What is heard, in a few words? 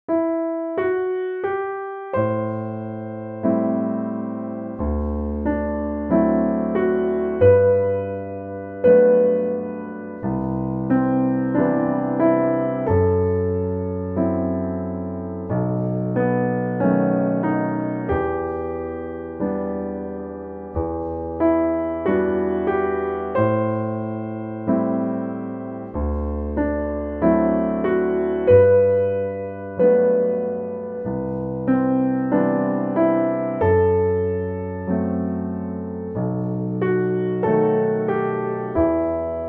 melody bass chords